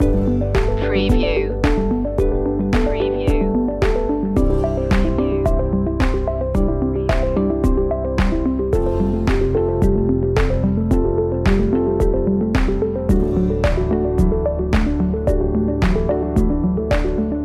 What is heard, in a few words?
corporate dreams loop